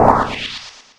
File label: Flare; 3DShooting